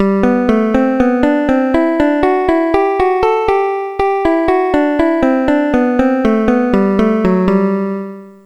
thirds; Dr. T's MIDI Recording Studio

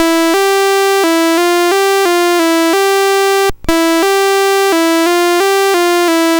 Number Quest BASIC, CSG8580R5; GAMES

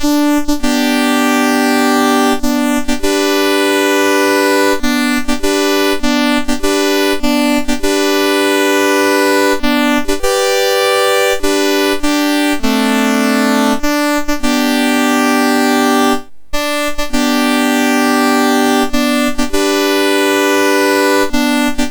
Taps; MOS6581R4

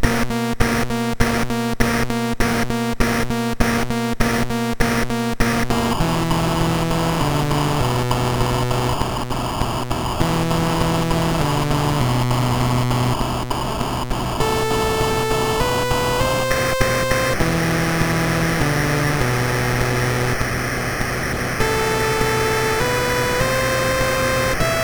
Drums and Stuff, MOS 6581

